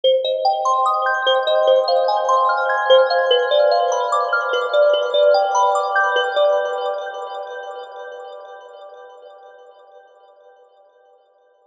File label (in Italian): Arpeggio completo